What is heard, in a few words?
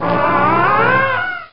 child die; tripodhopper